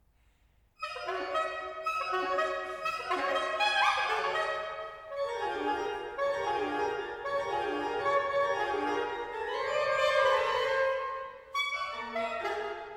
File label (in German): Sax Orgel; Friedenskirche